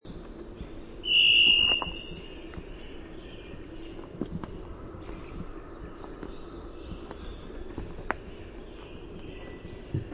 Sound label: varied thrush song